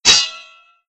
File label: clash, blades; garrysmod